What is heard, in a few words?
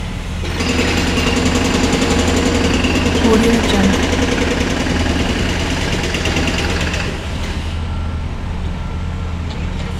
jackhammer loop; debsound